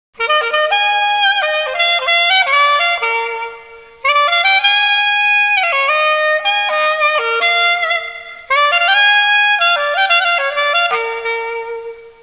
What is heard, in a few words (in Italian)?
suonerie musica jazz